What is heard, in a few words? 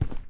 Footstep Dirt Heavy; Footsteps